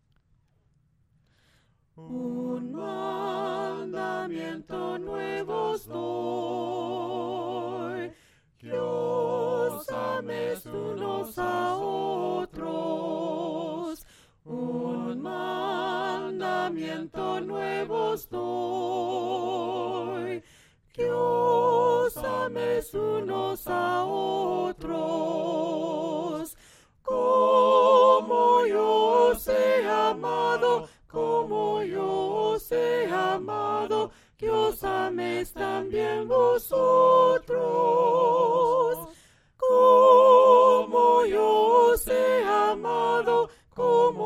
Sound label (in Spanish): Un mandamiento nuevo os doy melodia palabras